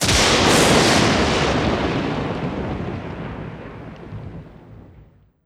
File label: ambience lightning; lucius